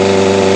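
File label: engine rev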